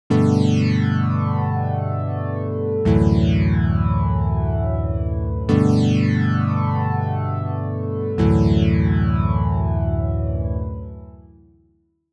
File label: Massive Analogish